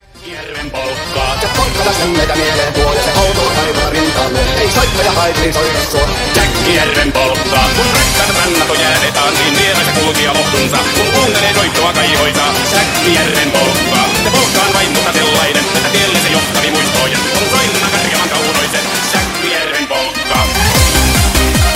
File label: disconection sound